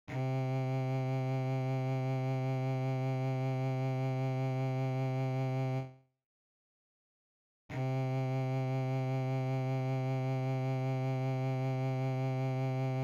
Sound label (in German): Stimmton C, violaschule